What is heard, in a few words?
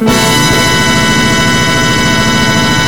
HIT BRASS